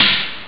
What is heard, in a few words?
hihat